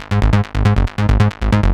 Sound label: Bassline WET 138BPM, G# minor; Bassline & Melody; Vengeance Samples; VTS1 Zodiac Kit